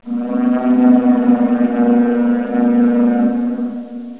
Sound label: TONE; Thief